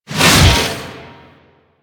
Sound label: Melee Whoosh; Warframe; DE; Sentients; Erra; Weapons